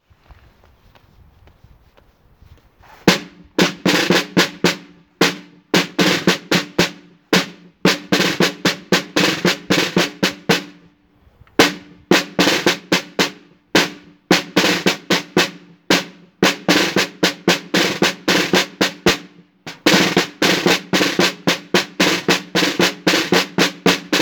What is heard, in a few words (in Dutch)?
live trommelmars TROMMEL